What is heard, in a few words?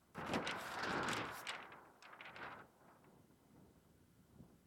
Paper wind